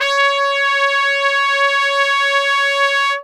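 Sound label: C#3 trumpet straight, Brass; Best Service; Brass Super Section; TRP STRGHT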